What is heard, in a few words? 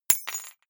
key ring small drop parquet soft